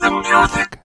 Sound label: TALKBOX